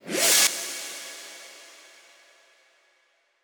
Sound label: ReverseFx 138BPM; Sound FX; G#minor; Vengeance Samples; Manhattan Kit